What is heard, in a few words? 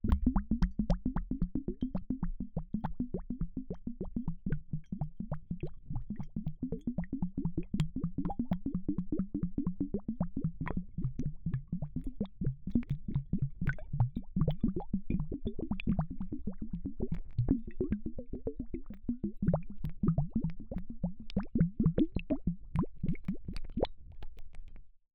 hydrophone, submerged, bottle, emptying, steady, choppy, light; Eiravaein Works; Unfathomed